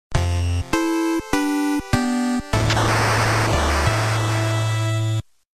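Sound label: sms music from 8-bit games; kontra; muzyka iz 8i bitnyh igr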